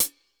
ROOM CH, ROOM KITS; Crypton - DrumBase5000

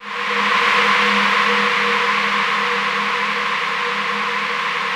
DEEP PAD G4 R, Yamaha VP-1; Music Store

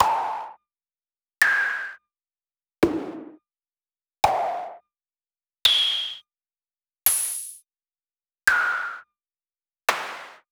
snare lifeforms noisesnare kit; Polyend; Recue

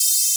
PWM C4; Analogon

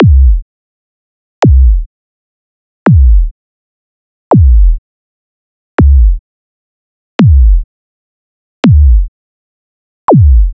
Subby Kicks

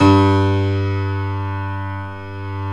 GRAND PIANO; OMI - Universe of Sounds

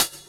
Hhat, Hihat; Equipped Music; Loungin House

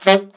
ALTSAX D3; Best Service; Alpine Volksmusik

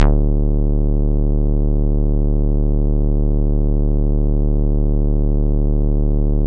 bass, synths

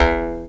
E JAW; Big Fish Audio - Didgeridoo and Other Primitive Instruments; JAW SIMPLE

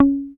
HOFNER MUTE, Bass

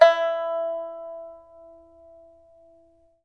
PIPA E5, CHINESE SET; Spectrasonics; Heart of Asia

